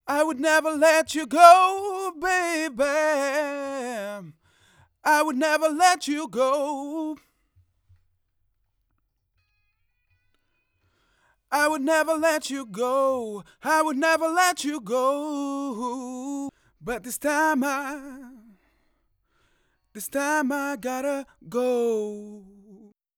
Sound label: Lead Vox